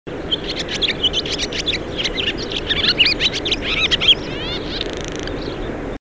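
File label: barn swallow voice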